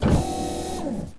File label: Mechanical Sound